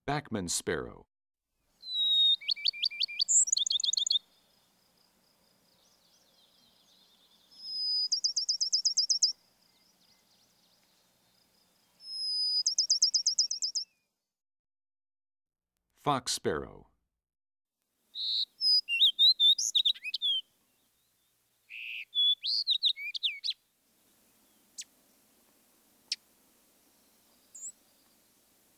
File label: Bachman's Sparrow, Fox Sparrow, Song Sparrow, Vesper Sparrow, Lincoln's Sparrow; Savannah Sparrow; Henslow's Sparrow; Bird Songs Eastern-Central